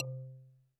percussion, Roland TD-20

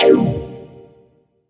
Stab C3, Club Techno; Zero-G; Stabs

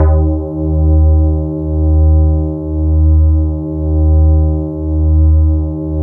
E-HARP, DX HARP; Wizoo - Powered DX

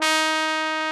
BRS TROMBO, Trombone; Roland L-CDX-03 Disk 2

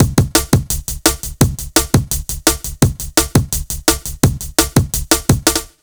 170 break, Explosive Jungle; USB Soundscan vol.05; AKAI

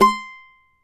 CAVAQUINHO C, Guitars; Spectrasonics; Hans Zimmer Guitars VOL-2

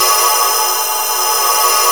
A#4 HOLLO; Northstar; The Wizard VOL-1; SYNCLATRON

